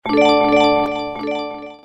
win game

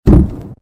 Boom Fall

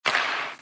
Hit Puck

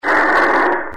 Car Crash Low

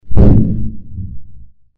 Pillow Burst

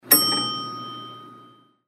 Elevator Bell

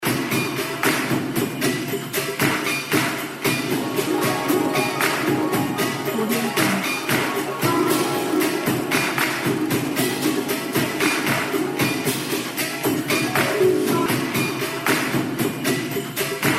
Chinese NY

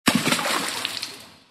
Splash

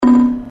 bump loud

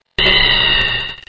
scream

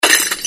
Bottle Break

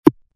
Golf Hit Ball

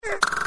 pop steal

